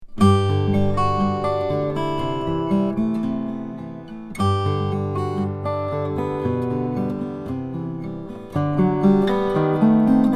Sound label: galloup hybrid fiddle back mahogany